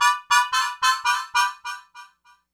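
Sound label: harmonica, Breakdance 172 B minor; Big Fish Audio; Hardcore Breakbeat Action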